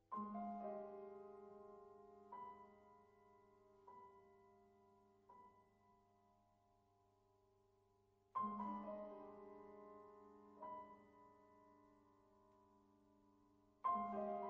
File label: jazz